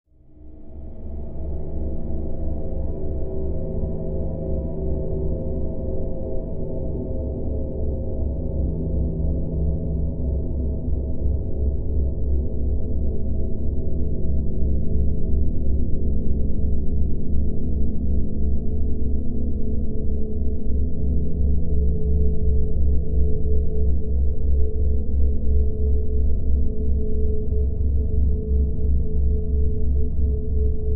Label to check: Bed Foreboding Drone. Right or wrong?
Right